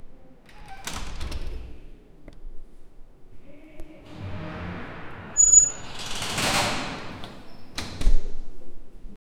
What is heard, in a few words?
store door opening